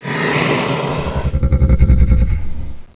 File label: nemesis idle